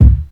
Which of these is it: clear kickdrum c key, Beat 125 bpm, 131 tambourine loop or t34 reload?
clear kickdrum c key